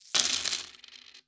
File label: coins table